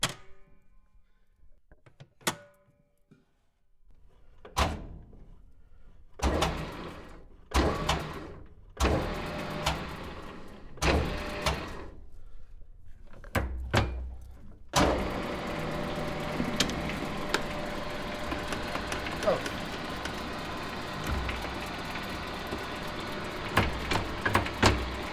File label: Lathe